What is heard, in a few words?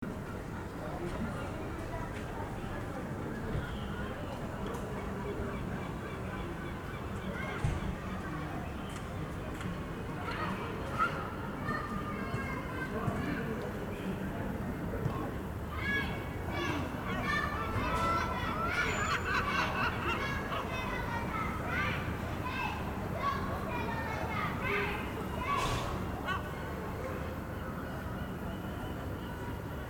istanbul reelection soundscape